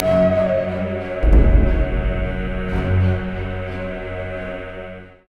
Stinger See Indians Short

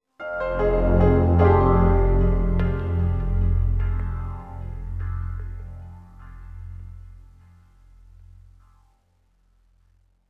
Oneshot Synth And Piano; zombie army 4 dead war; ps4 stadia switch windows xbox one

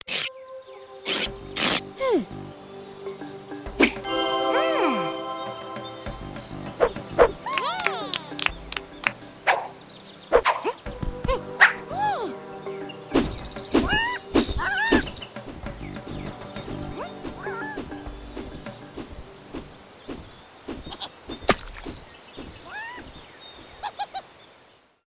Greek Bronze; Worms Forts: Under Siege

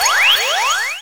Heal

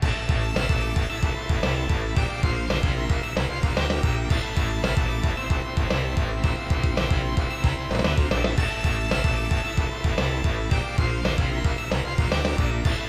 Lose; Super Fire Pro Wrestling X; SNES

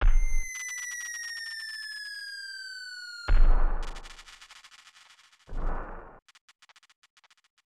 Unused Jingle; Lode Runner Twin; SNES

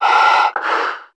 breath fast

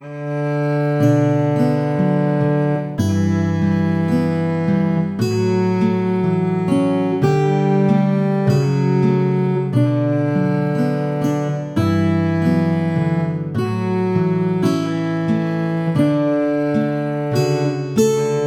The Scale Theme (Guitar & Cello Vers.); finding paradise

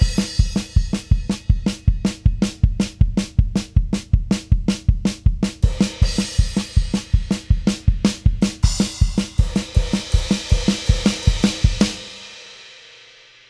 Music Transition Perc; Plants vs. Zombies Battle for Neighborville; GnomeGnight

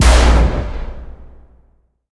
Music

